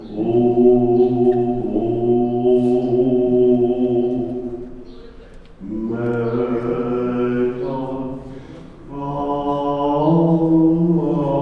lazar templom kihangositva utcarol

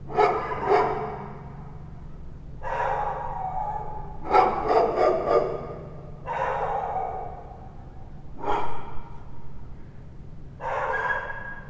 ejszaka ket kutya

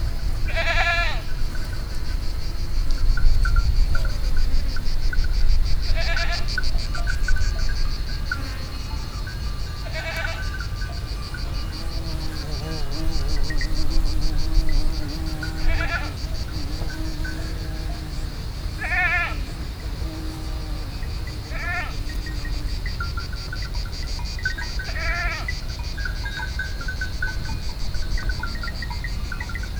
szállásukra tartó kecskék kabóca és rovarok kecskés út

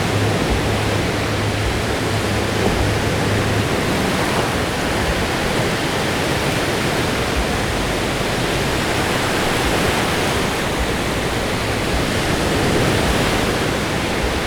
este 9 óra, tenger; thassos 2016; aranypart

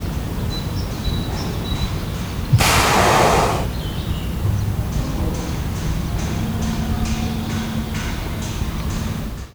egyet szuszog, nilusi vizilo; debreceni zoo 2012; marantz puska

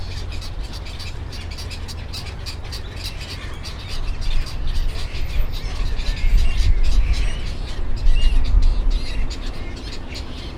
pásztorgém, Arrecife; lanzarote 2017; allathangok

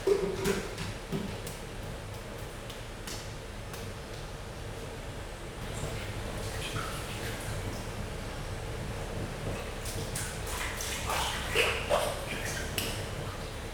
kis pingvinek kijönnek a partra, csobbannak majd kijönnek, pápaszemes pingvin; jaszberenyi zoo 2015

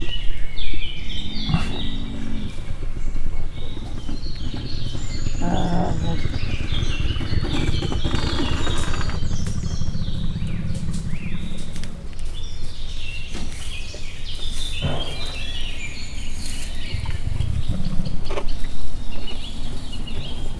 gurgulazik, ketpupu teve; miskolc zoo

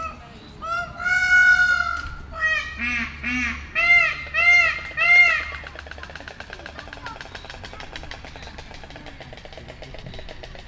páva fehér gólya; pecsi zoo 2017

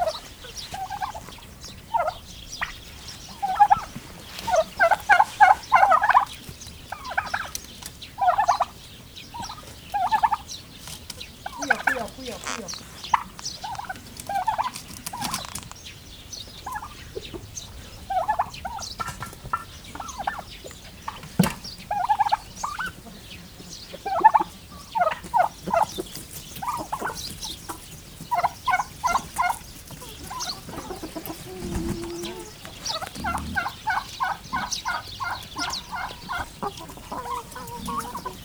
kotyog, pulykakakas; parasztudvar

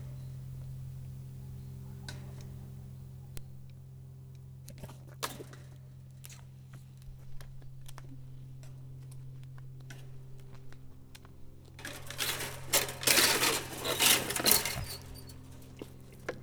gímszarvas, a kerítést döfködi, motoros repülő; budakeszi zoo 2010

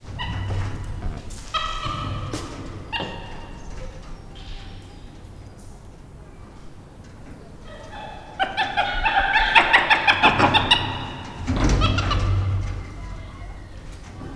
visszhangos majomhazban, kapucinus majom; debreceni zoo 2012; marantz puska

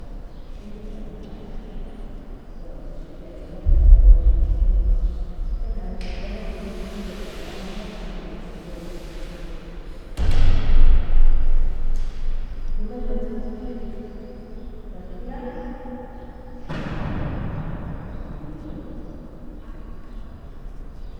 akusztika

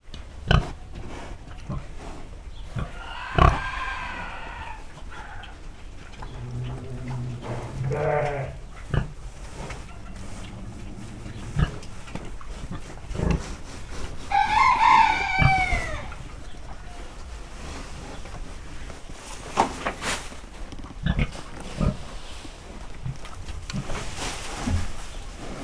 kakas birka disznó; parasztudvar

